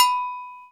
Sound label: doink, Bells; Misc Percussion